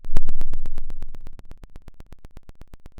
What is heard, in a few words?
pop, Sound of a thunderstorm